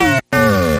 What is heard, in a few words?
droid; Plug Me In